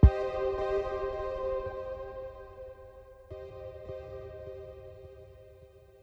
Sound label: Ancient Signal, Pads; BB 2HTC Samples Vol 4 Addendum